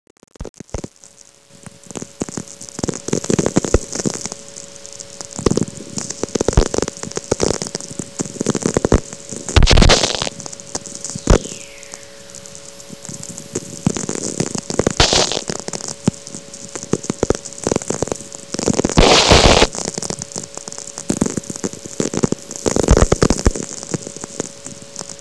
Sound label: sferic; Natural VLF Radio Emissions